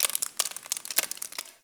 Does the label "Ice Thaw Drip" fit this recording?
Yes